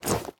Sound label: equip gold, armor; Minecraft